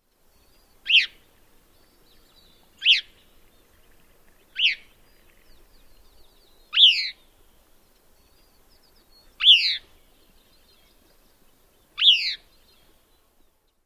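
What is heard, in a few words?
Gray-cheeked Thrush, calls number 1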